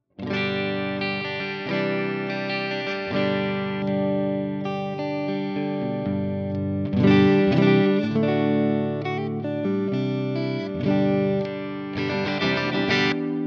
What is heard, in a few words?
JMP